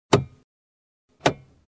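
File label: Sound of car central lock opening and closing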